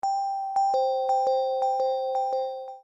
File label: Bell